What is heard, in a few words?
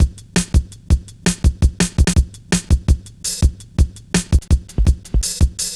reece steppa, drum loops; Zero-G Jungle Warfare 1, 2 & 3